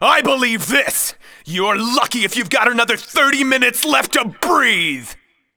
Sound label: fight